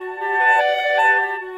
Clarinet Effect

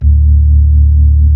B-3 Club Xfade, Keyboards of The 60's and 70's

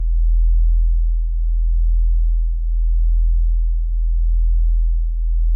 E1, SYNTH BASSES; Music Store Sound Service; Einstein

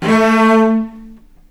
A2, VCS ARCO, String; East West; The Ultimate String Collection

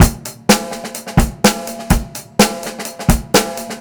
beat, Roots Funk; USB Soundscan vol.41; AKAI

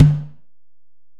Ac Perc, Drum Machines Alesis DM-PRO

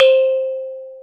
Metalofone C4; Planet Earth; Digital Sound Factory Planet Earth

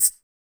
RSS PERC

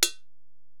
HiHat, Alesis DM-PRO

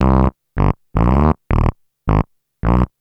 Bass, Futurist Drum & Bass

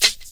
PERC, Dance Mega Drums; Best Service; PERC MIX

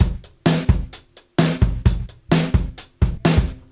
129, Beat Loops; Zero-G; Monster Beats

